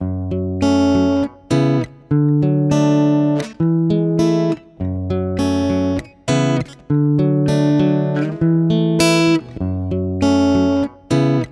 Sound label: Guitar 100 BPM (Fmaj), Guitar Loops; Hay; Ghosthack; Guitar Loops & Shots